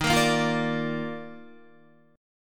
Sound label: D sharp Major 7th Suspended 2nd D sharp Guitar, chord; strummed; chordshape; 10,13,10,10,10,10; JGuitar